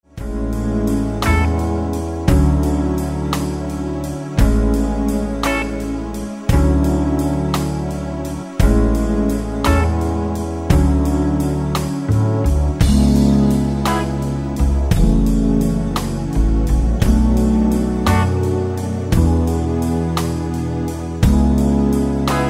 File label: smooth jazz jam